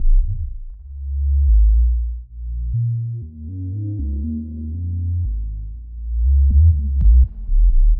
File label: 120 F# Sub noodle, Bass Loops; Touch Loops; BlissedOutBeats